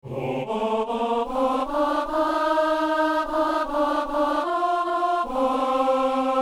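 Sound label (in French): ténor; A tes enfants, Seigneur, accorde ton amour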